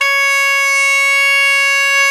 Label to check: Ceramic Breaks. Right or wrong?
Wrong